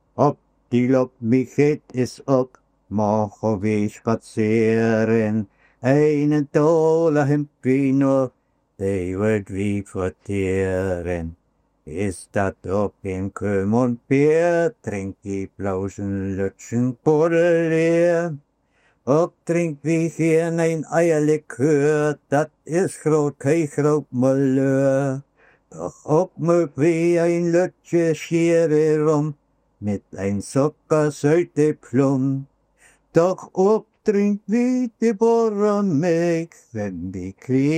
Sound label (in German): gesang, mien geld is op